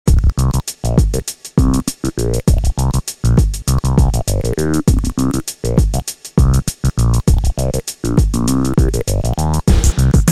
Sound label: beat